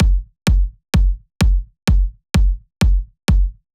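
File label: Drum Loop 128BPM bass drum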